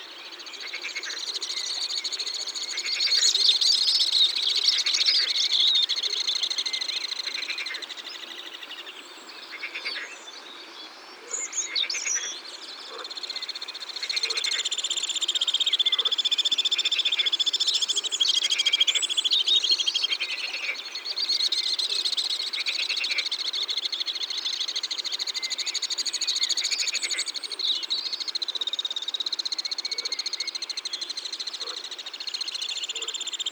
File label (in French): Martinet A Ventre Blanc; Ardeche; Concerts Naturels De l'Ardeche